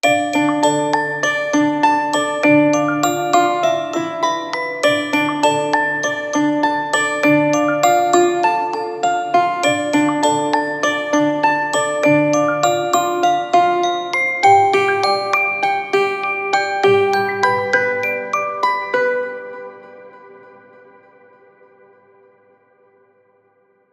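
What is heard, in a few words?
Celesta & Guitar - A minor 100BPM; Construction Kits; STEMS; Ghosthack; SkyRealm; Kit 10 - Windhelm Forest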